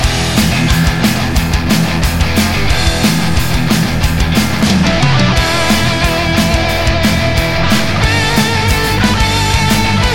Heavy Metal

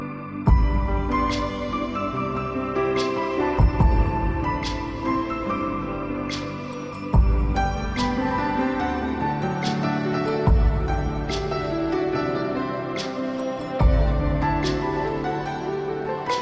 saddest piano tone; classical

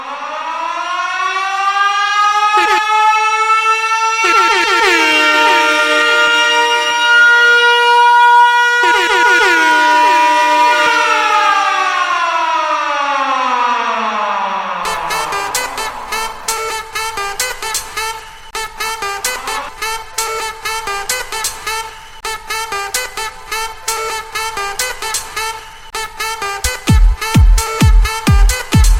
siren mix airhorn

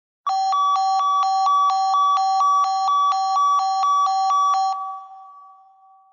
awesome ring tone; dance

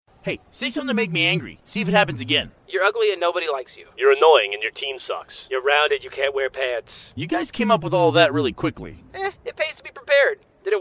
laser; rvb

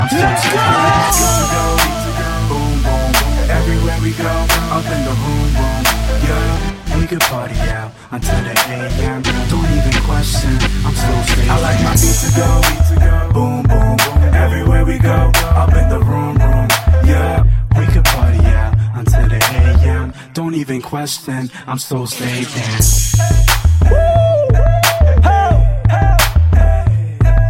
My Beat

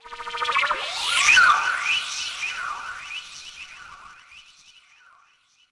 Notification

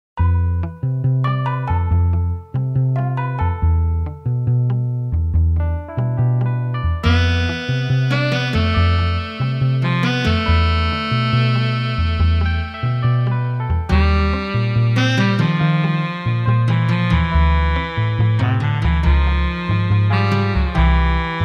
New wave bossa nova

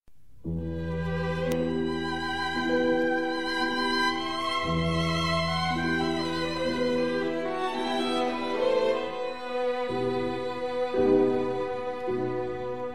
Instrumental

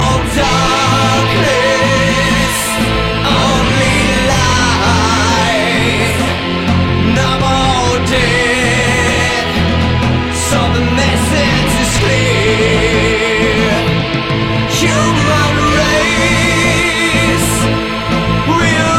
Dark Metal

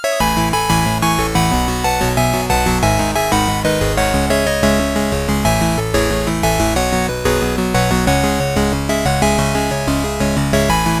8-Bit

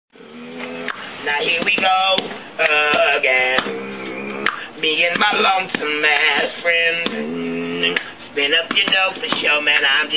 homeless g funk beat boxer behind the gallery; hvw8 gallery

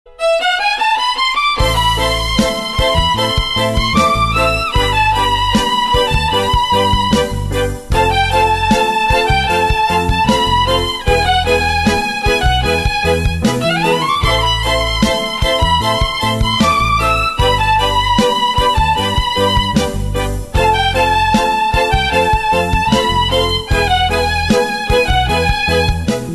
SAD LOVE PIANO

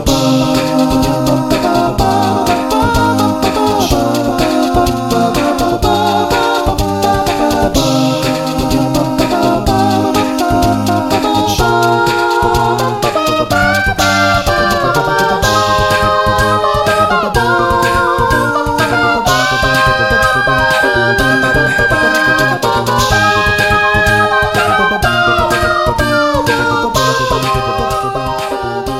Acapella; Guile

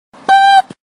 boop

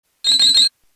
bb notifier louder; ringtones; sms

